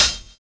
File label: SONAR BEA; Univers Sons - Kitch 70s